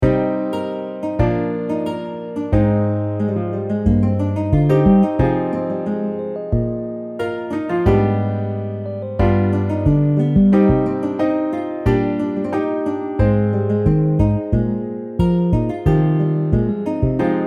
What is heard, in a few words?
Piano Bass